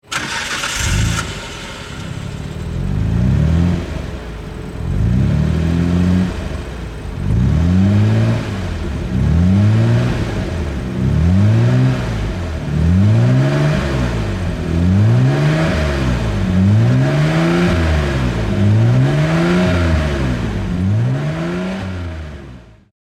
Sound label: Skyline GTR BCNR33 RB26DETT